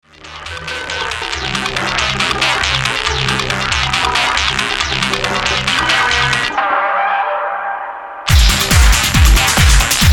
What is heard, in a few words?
Guitar Gas